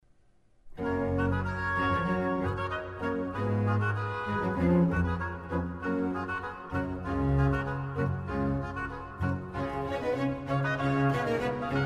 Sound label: Aria Soprano; Quoniam te solus sanctus; Antonio Vivaldi